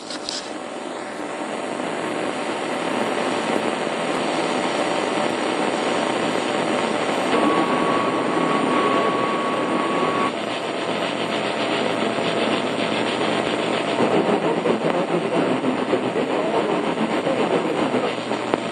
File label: Radio Waves